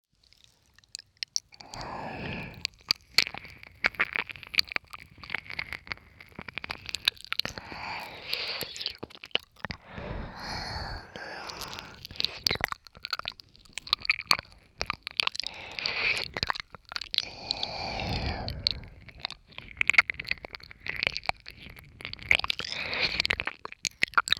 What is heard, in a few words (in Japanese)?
耳介舐めゆっくりループ